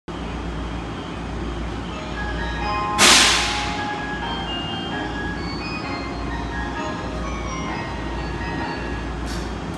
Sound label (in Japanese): JR武蔵野線八丁堀東, train sounds